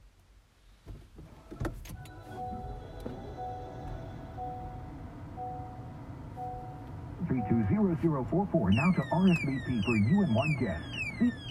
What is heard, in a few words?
weird alert when starting car; clarity